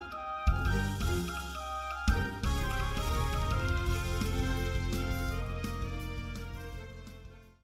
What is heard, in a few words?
battle music theme; axie infinity